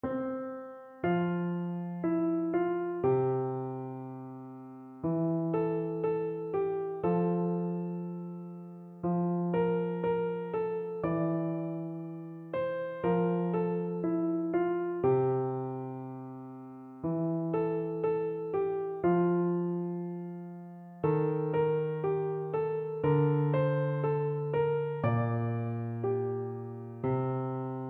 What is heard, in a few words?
etude easy piano; chopin